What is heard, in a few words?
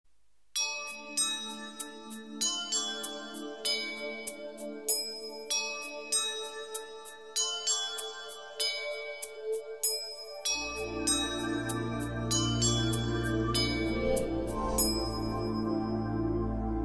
Alarm Pleasant